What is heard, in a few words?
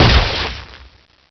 emp, weapons; quake2; mnhcoop